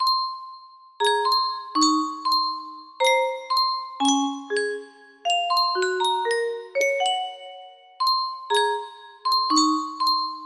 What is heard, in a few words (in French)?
yunsheng boite a musique